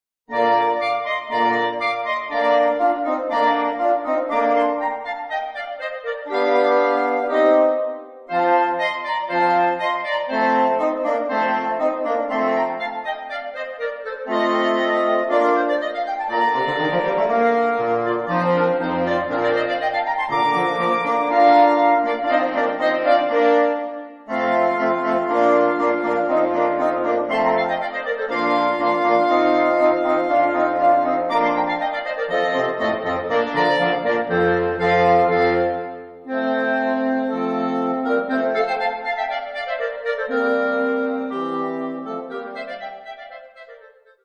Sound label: Sextet; Krommer Sextet